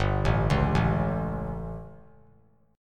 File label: G Suspended 2nd Flat 5th G, chord; Gsus2b5 arpeggiated; JGuitar